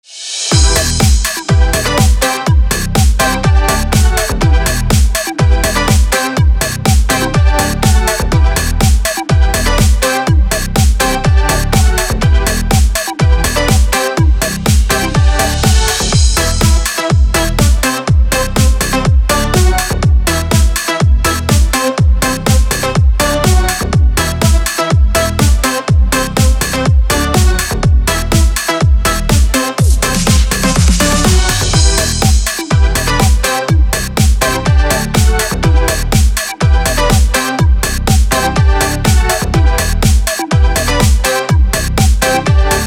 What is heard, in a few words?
synth pop background music